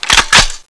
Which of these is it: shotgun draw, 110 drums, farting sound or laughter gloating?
shotgun draw